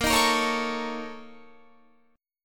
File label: A sharp Minor Major 7th Double Flat 5th A sharp, Guitar; 18,18,20,18,21,20; 4E,4A,5D,5G,5C,6F; strummed